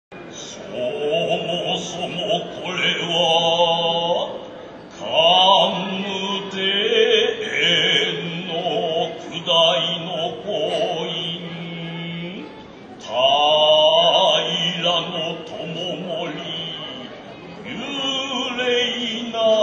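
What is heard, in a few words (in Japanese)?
人形囃子, からくり人形演技の際に演奏される; 人形囃子3; からくり人形(傀儡師)演技の際に演奏される。船弁慶の場面。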